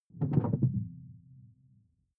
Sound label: sci-fi alien bloom stop; swooshes-swishes